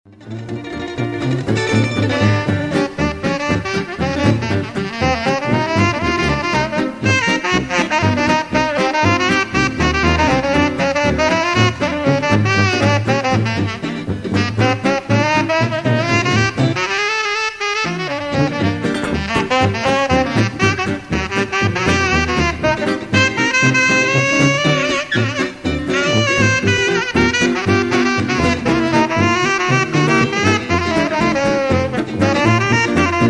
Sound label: blues sax